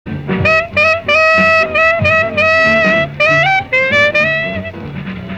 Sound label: jingle bells; bird